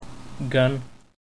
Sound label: Gun